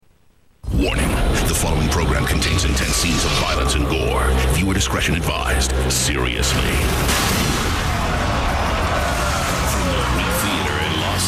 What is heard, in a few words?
scream